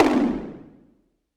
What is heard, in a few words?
Tom Phase Hi